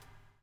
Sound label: BUBL; Spectrasonics - Backbeat